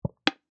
Oil Can Single, Industry, Oil, Can, Single, Metal, Buckle, Antique; Hand Tools